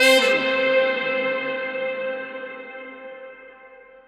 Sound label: saxy